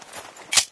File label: pistol world reload; tf